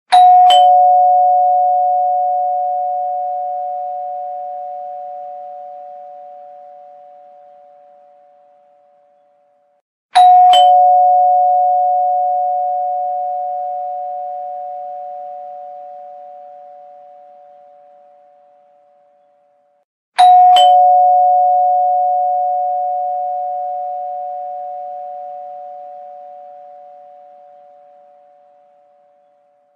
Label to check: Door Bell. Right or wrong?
Right